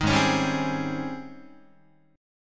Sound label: G sharp Major 11th G sharp, Guitar; G#M11 Chord